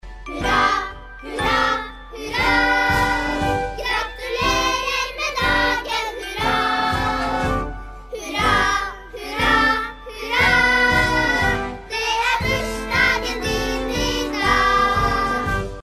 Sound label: Hurrah